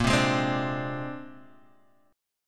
C 7th Suspended 2nd Sharp 5th, A sharp

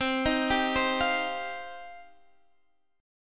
G 7th Suspended 4th Sharp 5th C

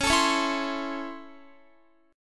Db Minor Major 7th Sharp 5th Db down Guitar; strummed; 4E,4A,5D,5G,5C,6F; DbmM7#5

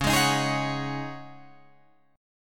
Db Suspended 2nd Suspended 4th Db Guitar Drop D

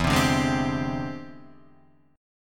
E 7th Sharp 9th Sharp 5th, chord

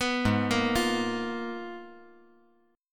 G sharp Minor 6th Add 9th; G#m6add9 arpeggiated; 4,8,6,8; JGuitar

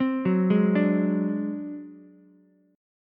Ab 7th Flat 5th Ukulele, chord; Ab7b5 arpeggiated; JGuitar

